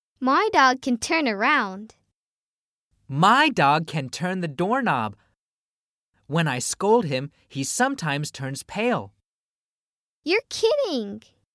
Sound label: chants